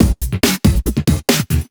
Breakbeat 140 BPM

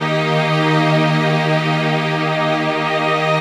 strings D#, vocal house; PS-28 Vocal House Samples

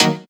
Synth Cuts; Vengeance Samples; VES1 Instruments

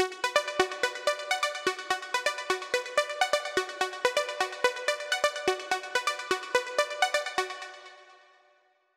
126BPM Seq Root B; Vengeance Samples; Klondike Kit

